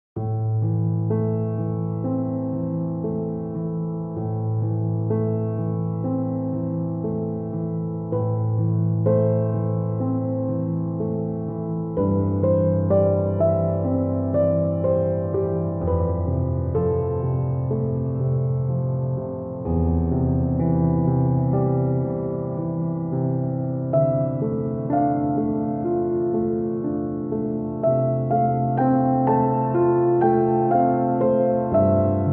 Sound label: piano